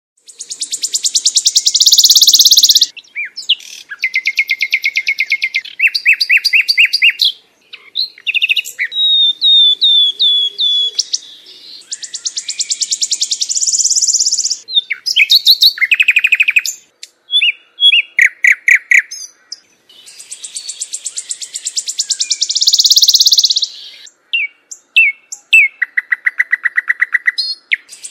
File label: Mobile ringtone